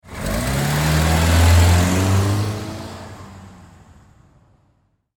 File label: Vehicle Auto Drive Off Fast Dodge Stratus 2000 Automobile Car; Cars